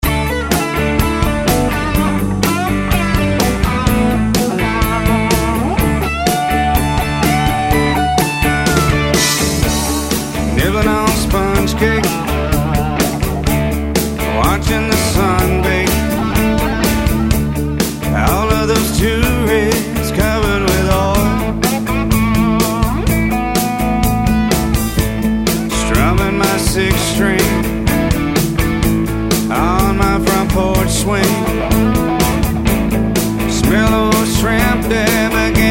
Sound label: SOLO EL GUIT